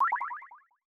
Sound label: Blip; Samsung Theme for Windows XP